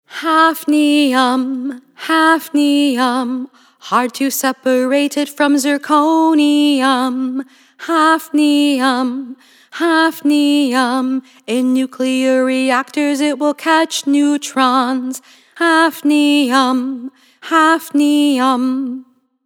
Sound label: Hafnium Element Jingles